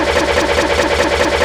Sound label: MOTOR IN LOOP 4X4; SIMUZENSOUND